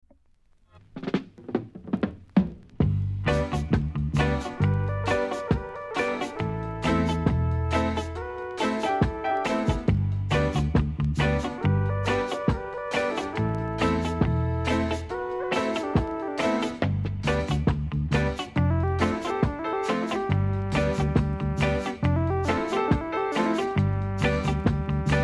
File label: REGGAE DISCO ROCKERS